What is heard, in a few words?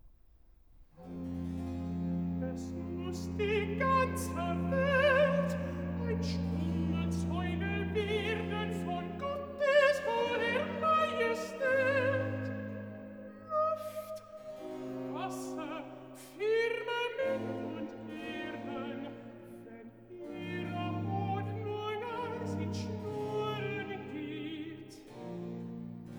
Recitativo (Alt), BACH for ALTO; BWV017; Wer Dank opfert der preiset mich; Es muss die ganze Welt ein stumm